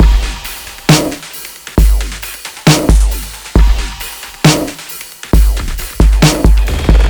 Polar Kit A; Барабаны

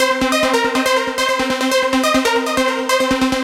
Lead; техно транс

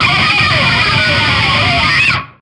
Guitar Scratch, metal; Метал

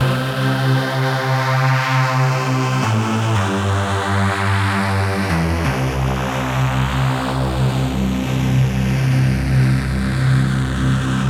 Fresh Dark Pad; пэды

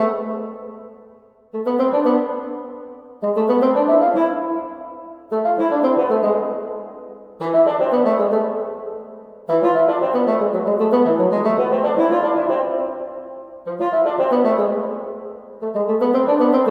bassoon; драма

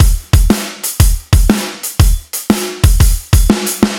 Tri Beat

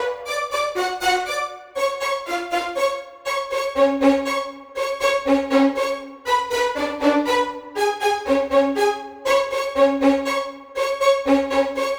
violin; playful waltz

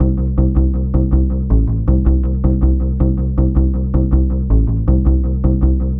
Ambient Mute; Downbeat

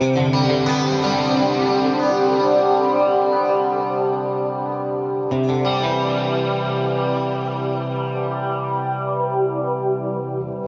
Moonwalk, guitar

